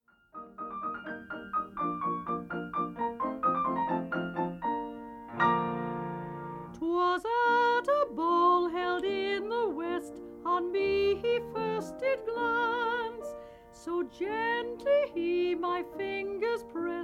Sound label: parlour piano